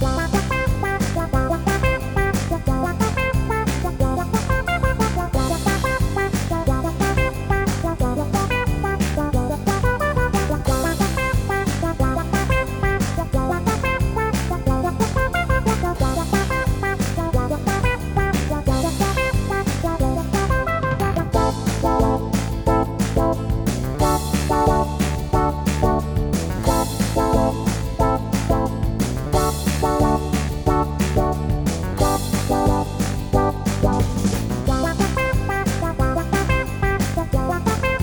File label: Pet Antics, funny